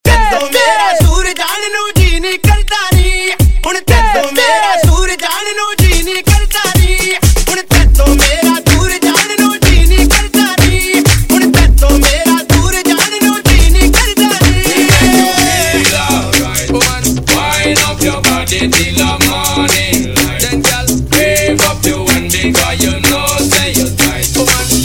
Panjabi Mix

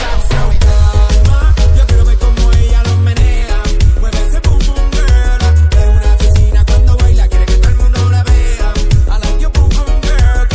ringtone

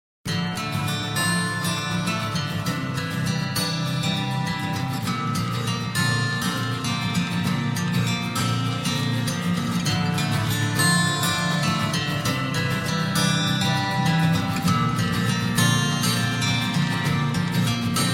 Super Gitar

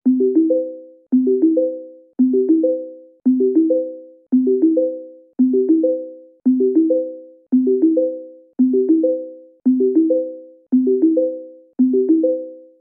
Iphone Inspired

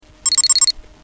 Triple Beep Sms; meloboom

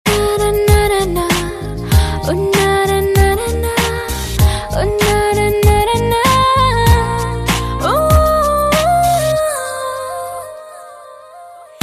Female Humming

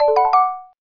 Sms Notifier

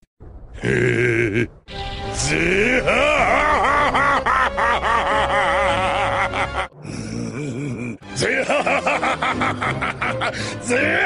Laughing; Blackbeard

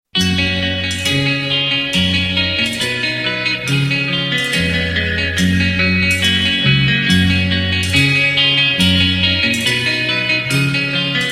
Phone Tone

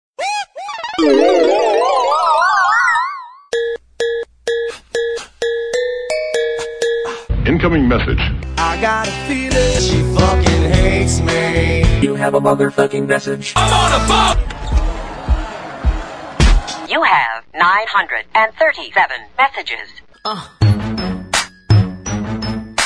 Mix Sms Tone

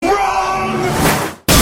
Wrong

